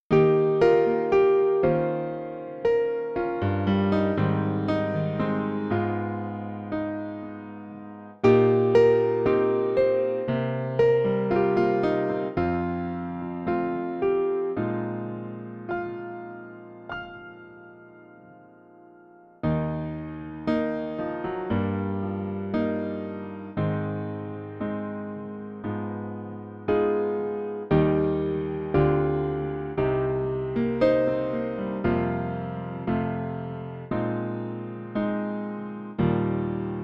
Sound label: Eb piano trak